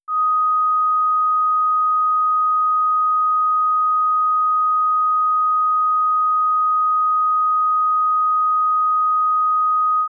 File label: 1.25 kHz